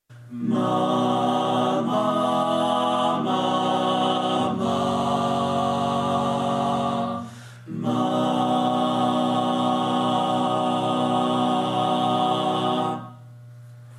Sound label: male